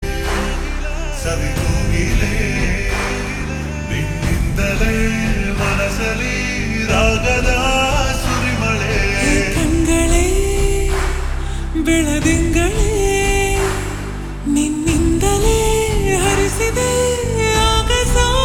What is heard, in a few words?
Slowed Reverb; kannada; Amaran; Sai Pallavi